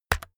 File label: keyboard external key hit fast